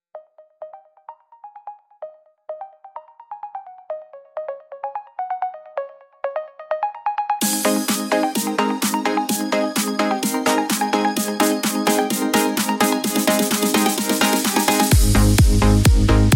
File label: raid dungeon, music; freepixel; Action